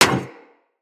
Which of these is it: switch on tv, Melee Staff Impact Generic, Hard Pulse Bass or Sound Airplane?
Melee Staff Impact Generic